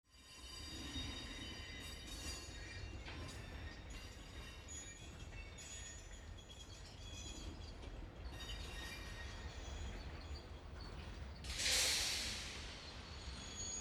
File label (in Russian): Vizg skrezhet svist koles poezda